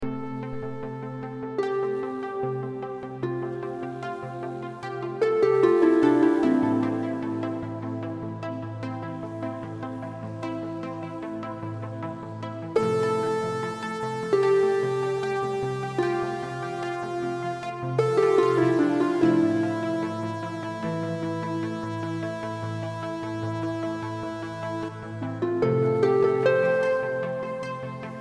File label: New Beginning 120bpm